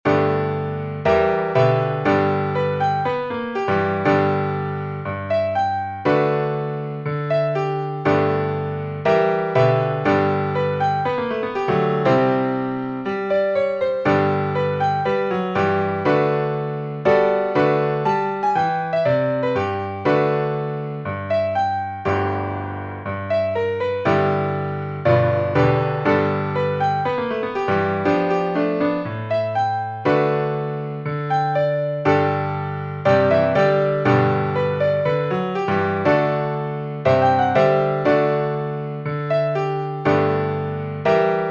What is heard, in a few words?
Piano Rag